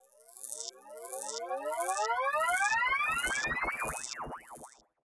activate mono; Dokobots

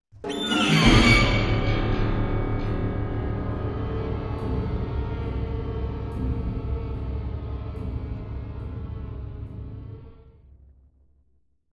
Music Stingers stinger Trap Kill

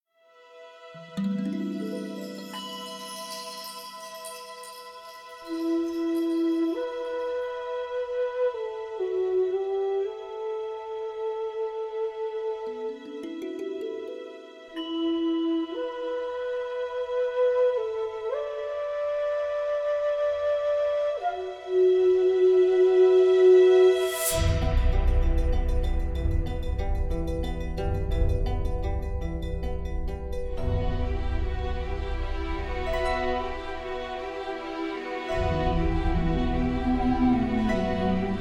Music Stem

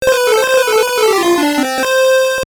Jingle; doraemon no study boy 4 shou ni kokugo kanji game boy

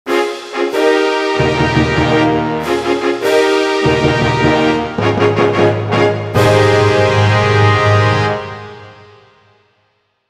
Player Fanfare; Pokemon Battle Revolution; wii

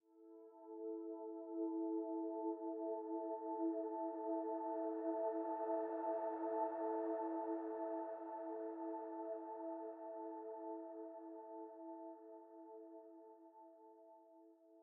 85bpm; Legends of Pegasus; windows gamerip 2012

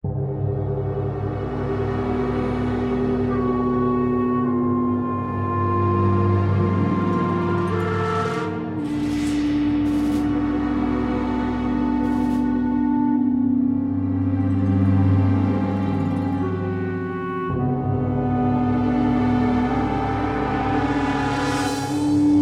Dungeon; Puzzle Quest 2; Android; Xbox 360

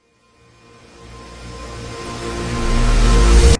Reversed Stinger; mirrors edge ios gamerip 2010

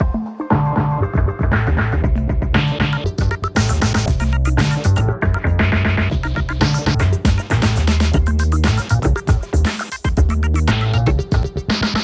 90 hiphop 118bpm; gamerip; hot lava; ios macos windows

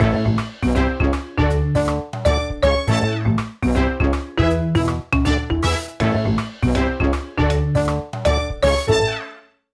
Marimba LONG WIN

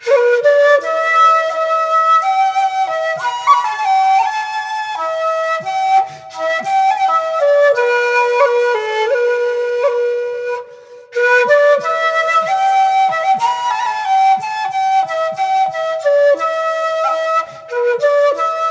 Wooden Flutes; Spore unofficial galactic score

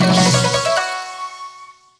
Jingle Game Start; StreetPass Mii Plaza; Ninja Launcher

